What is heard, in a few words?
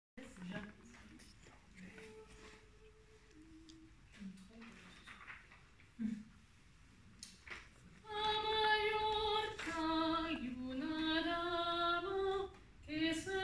bass; la dama Mallorca